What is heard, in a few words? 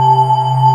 RezWave C2; Proteus 2000; Digital Sound Factory Proteus 2000